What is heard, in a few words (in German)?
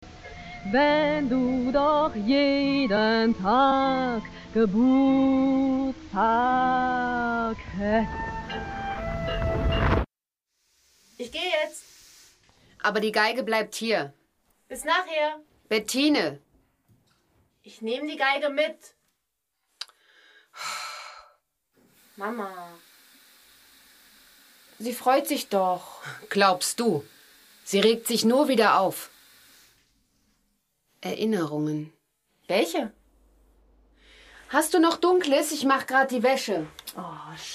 variationen fuer violine solo; hoerspiellust; Hörspielsommer in Leipzig